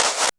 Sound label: REV CLAPS; Back In Time Records; Big Bang